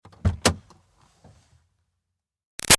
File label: Car Highlander Door Interior Open; 2005 Toyota Highlander V6; Cars; Vehicles